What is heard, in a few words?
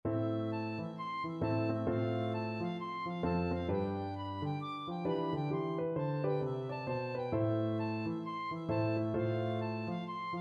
tam lin, tin whistle